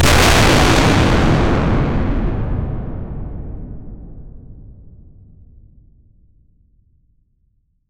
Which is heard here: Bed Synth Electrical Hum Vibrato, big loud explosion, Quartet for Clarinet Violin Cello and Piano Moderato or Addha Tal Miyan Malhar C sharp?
big loud explosion